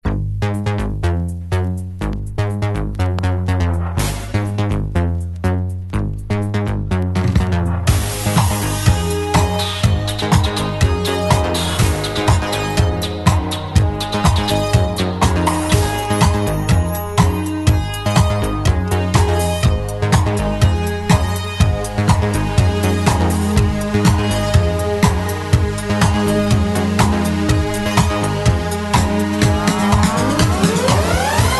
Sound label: Disco, Instrumental